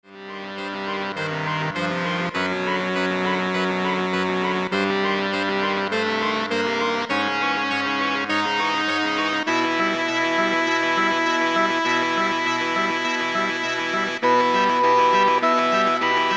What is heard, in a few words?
Sax Choir